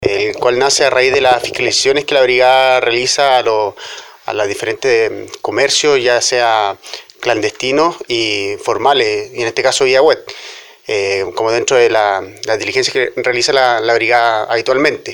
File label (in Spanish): guitarra